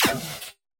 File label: Shield Impact